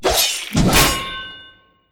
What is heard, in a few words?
Logo